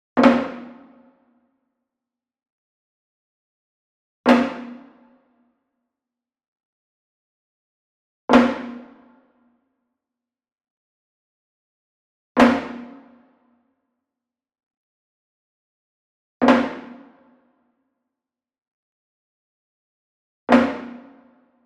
Wood Percussion Wood Drum Floor Flam Beater Medium; boom library; Cinematic Strikes Construction Kit